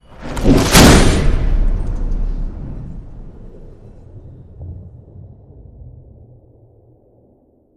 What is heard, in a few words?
Hit Bolt Explosive Pressure